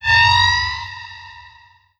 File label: shoot warning layer, strider, combat; creatures